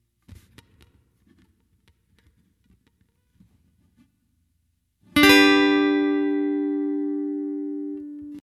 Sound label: Acoustic Fill